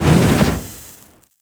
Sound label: Lizzie Fire Shot Loop Stop; Warframe; weapons